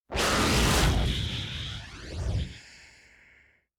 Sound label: creature extinction; anemoi